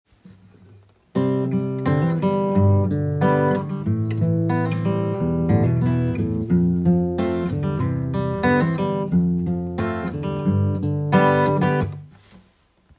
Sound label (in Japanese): カノン進行